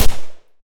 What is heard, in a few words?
fire; STALKER; starwars